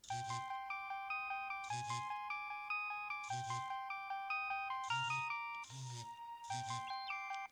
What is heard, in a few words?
nokia 6100 ringtones